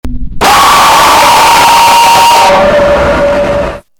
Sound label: jumpscare sfx music and vocal